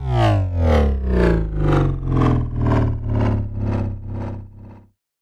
Bass Drop; SoundsCrate

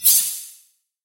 anime sword swipe up; SoundsCrate

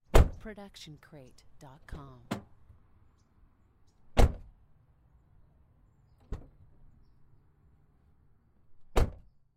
car door close open

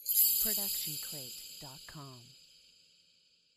Shimmer Hit; SoundsCrate

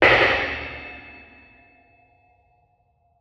horror metal hit

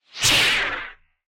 lightsword turning on; soundscrate

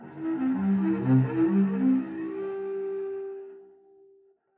Cello Slide Up; SoundsCrate

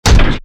Robotic Footstep; SoundsCrate

